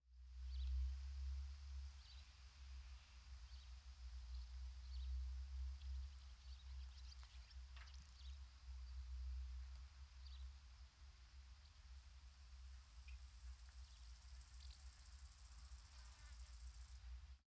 Friche Industrielle Rhinau Basse Voiture Et Pépillement

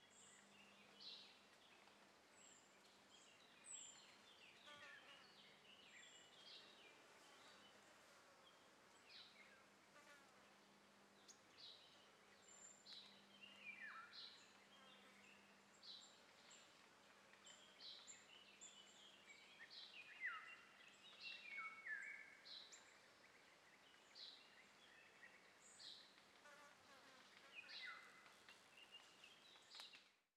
Très Ancienne Carrière Avion Oiseaux Et Battement Ailes Brindilles Moustique

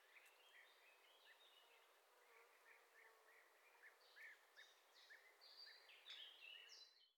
Friche Bord Forêt Oiseaux Et Grenouilles, Très Ancienne Carrière Lac

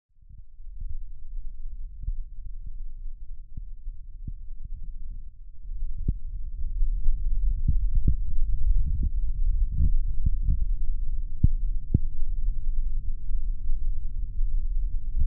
Petite Ceinture Grondement Tram

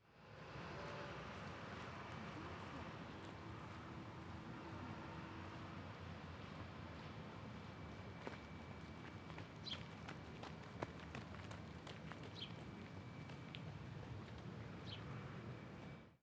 Voiture Pigeon Course Pied Gravier; Petite Ceinture

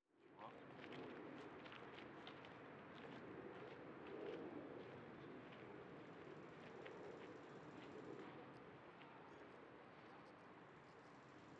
Vent Pas Gravier Enfant Pleure Discussion Au Loin; Petite Ceinture

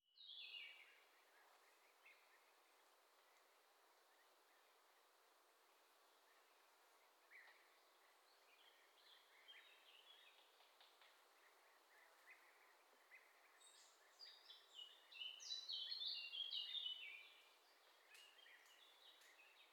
Très Ancienne Carrière Lac, Friche Bord Forêt Oiseaux Brindilles Pic Au Loin

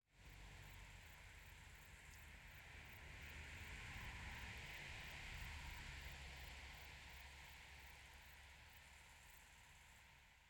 Bord De Route Allemagne Passage Voiture

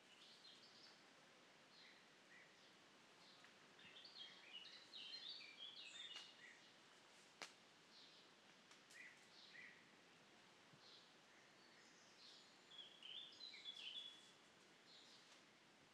Très Ancienne Carrière, Oiseaux Long Et Brindilles

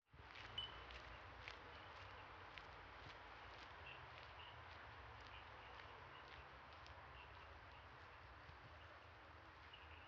Petite Ceinture Pas Clochette Radio Vent

